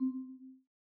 ui Favorite; Mindustry

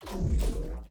shield Wave; Mindustry

